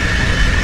Rocket Missile Loop; SFX Kit; Weapons

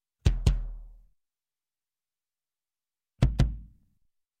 Bass Drum double Kick, Music, Percussion; Sound Ideas; Series 2000

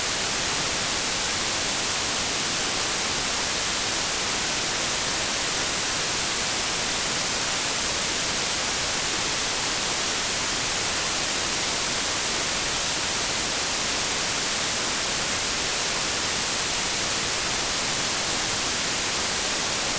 TV Static; blindside

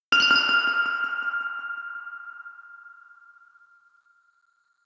Reaktor Drop; Inquisitor 2

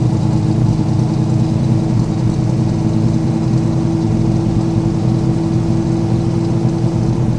69 Mustang Loop, Automobiles; SFX Kit

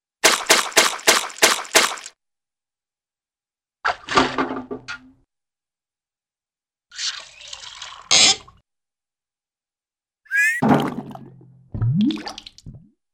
Water Sounds Short Ascending Whistle; Cartoon; Hollywood Edge; Cartoon Trax

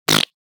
Fart Foley - Short And Healthful; Hollywood Edge; sound designer's toolkit v2

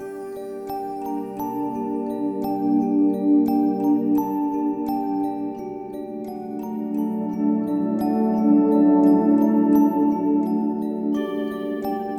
Fantasy Exploration loop; Shockwave Sound - Fantasy Worlds Vol II+III

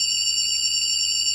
Nuclear Scanner Loop, Sci Fi; 1001 Sound Effects

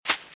Velociraptor - Footstep - Grass - Run - Right; RadwinIsland

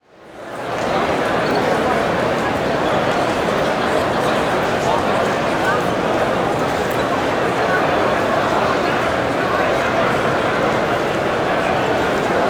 Crowd Indoor Large Hall, Walla; Sound Ideas; Walla Series

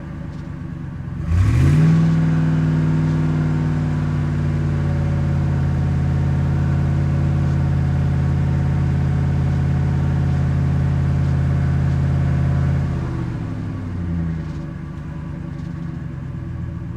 Truck Expedition Fast Idle; Trucks

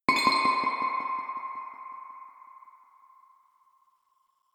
Reaktor Drop; Inquisitor 3

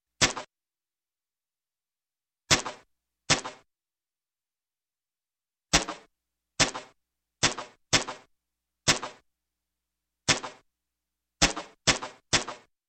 Gun, Hand Gun .22 Cal Single Shot; Sound Ideas 2000 Series

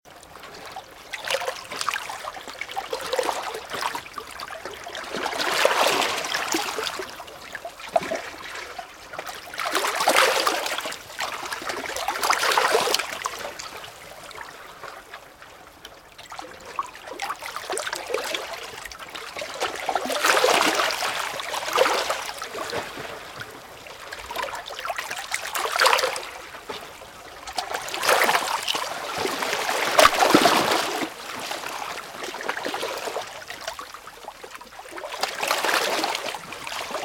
Ocean - Waves - Underwater; RadwinIsland

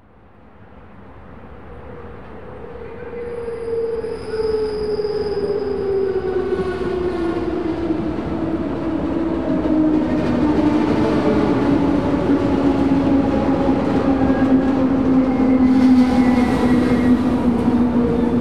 train station start stop people; best service Blue Box

